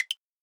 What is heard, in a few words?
Woodblock